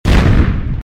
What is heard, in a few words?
Boom